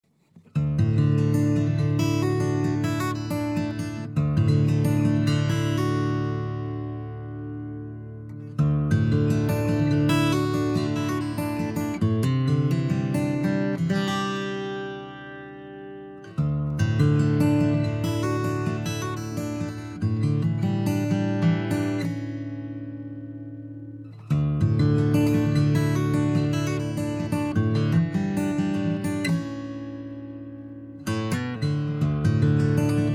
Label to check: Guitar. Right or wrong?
Right